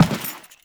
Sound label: Step Default, Footsteps; Warframe; Teshin